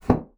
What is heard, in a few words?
bassoon slap; Xsample VOL-6; C BASSOON